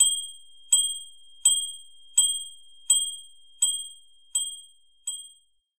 gas; Quentin C's Gameroom

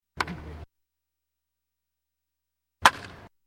Baseball Hit Ball; Sound Ideas 2000 Series

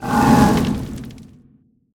Fire Enemy Growl; AudioWizards

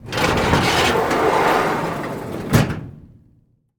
Morgue Drawer Close; Medical; Blastwave

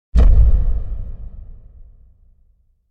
Impact Drum Dull Low Reverberant Hit; Hollywood Edge; sound designer's toolkit v2

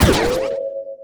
Pickup Scifi; SFX Kit

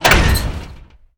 Door Large Metal Stop; SFX Kit; Doors

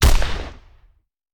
Hand Gun; Sound Ideas; Guns

